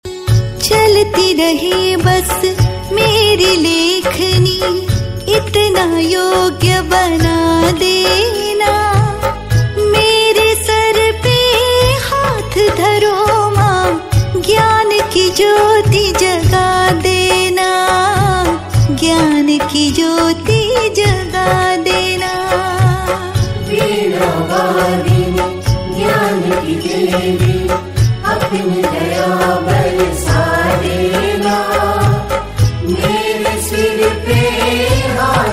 Saraswati Puja Ringtons

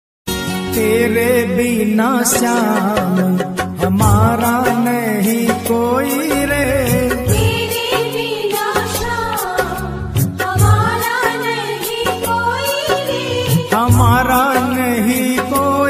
Khatu Shyam Ji Ringtone, Bhajan

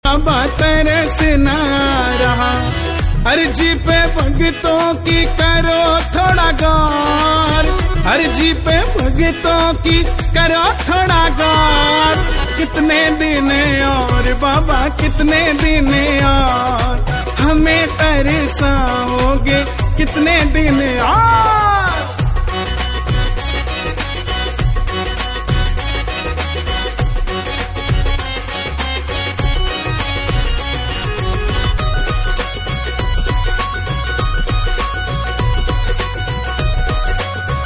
Bhajan Khatu Shyam Bhajan

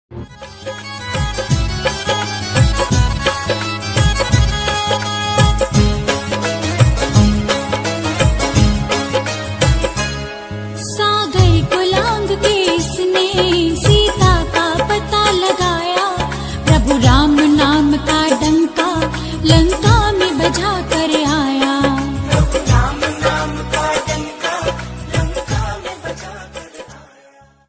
Jay Shri Ram ringtone bhakti ringtone

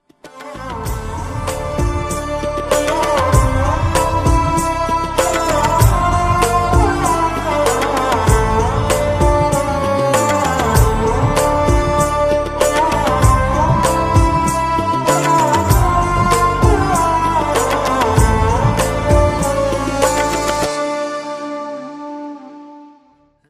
Instrumental Ringtone So Dukh Kaisa Pave

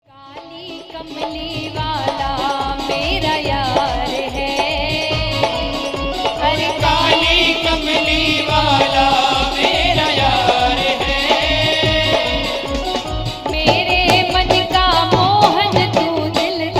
Bhajan

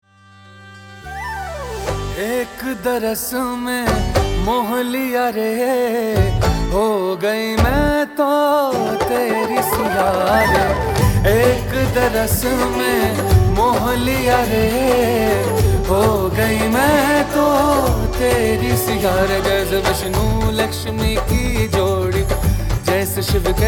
Ram Bhajan Ringtone

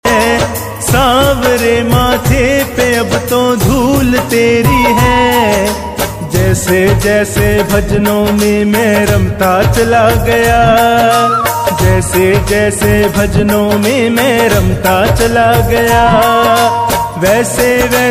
Khatu Shyam Bhajan

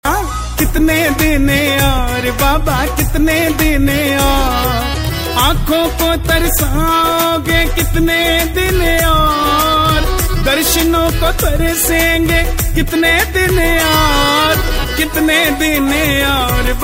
Bhajan Khatu Shyam Bhajan

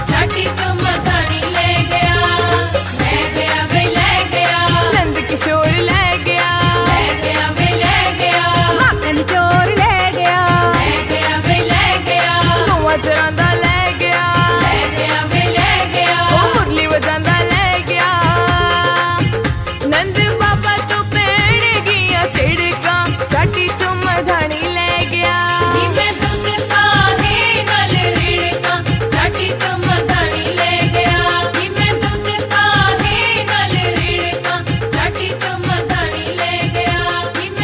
Krishan Bhajan Ringtons

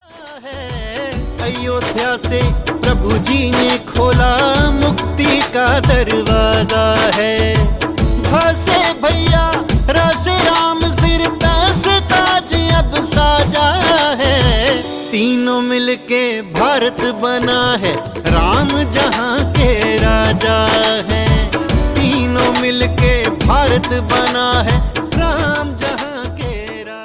Ram Bhajan Ringtone

